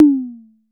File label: TOM MID; Crypton - DrumBase5000